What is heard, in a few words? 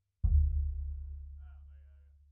Sub